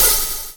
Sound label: OH 70 S; E-Lab; X Static Goldmine 2; akai